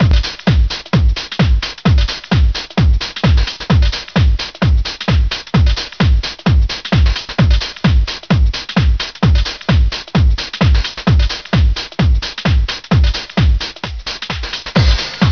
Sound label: beats